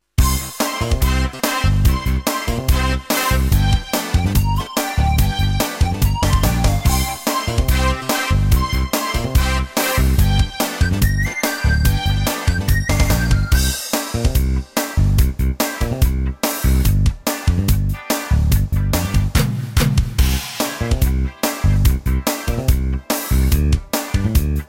Playback normales Tempo

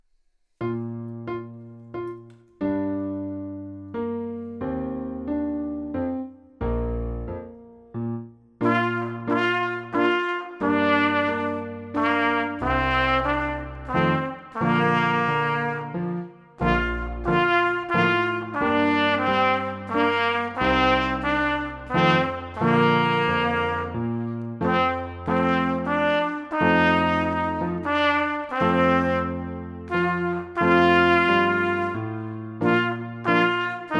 Trompetenfibel Kleiner Walzer

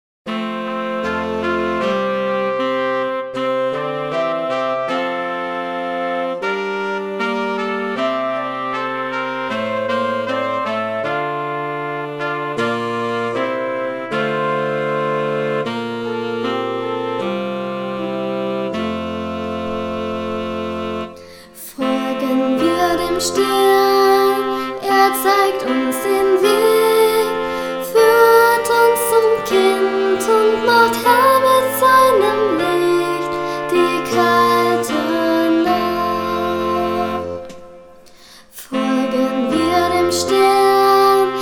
mit Gesang

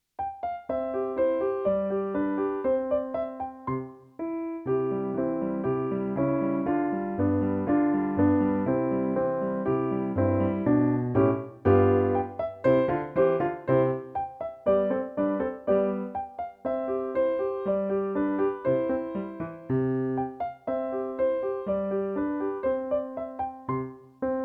Vogelhochzeit in C

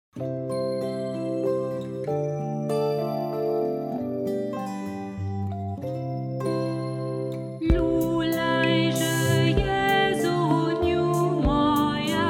gesungen

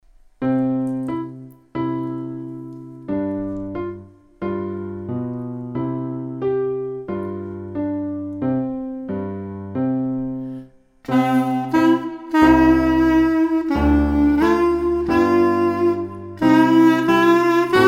Clarineo Kleiner Tanz

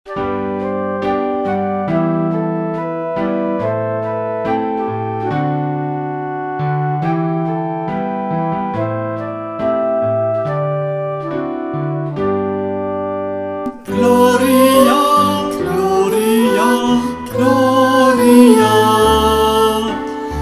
Gloria mit Gesang

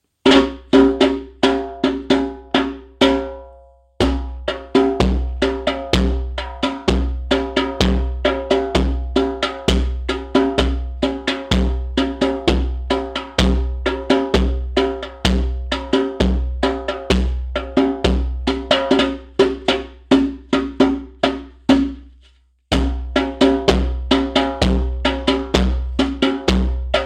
Begleitung Djembe; Online Musikstunde

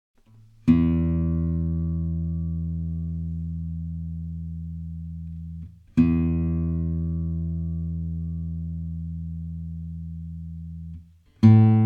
Stimmtoene

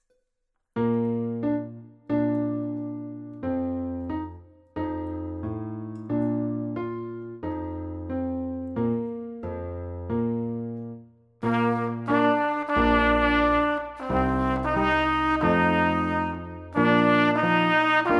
Trompetenfibel Kleiner Tanz

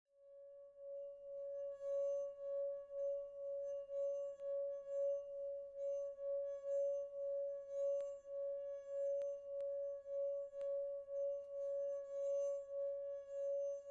Weinglas Gesang

